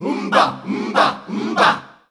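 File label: Rhythmic Chants, Voices Of Africa, 90 bpm; Umba Umba Umba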